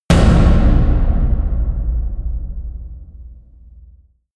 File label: huge tom hits; music elements; percussion